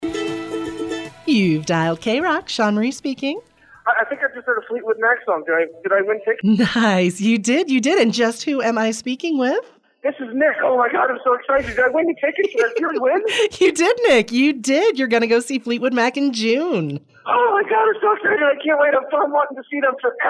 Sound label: Rock